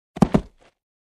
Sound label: Pile of boxes bang